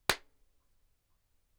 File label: Snares & Claps, One Shots